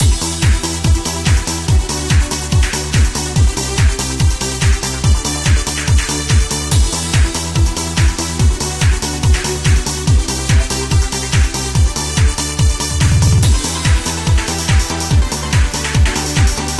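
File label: menu music (loop); Screen Music Scroll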